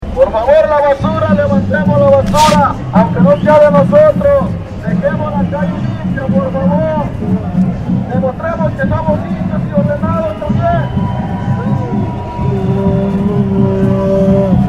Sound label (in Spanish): BASURA PITOS TAMBORES